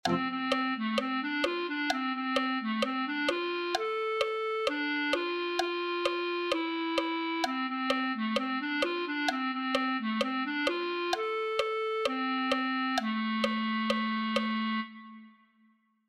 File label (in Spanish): clarinete